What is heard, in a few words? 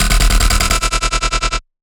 Bass D# Startup, Dubstep; Bass One Shots; Ghosthack; Dubstep Sound Arsenal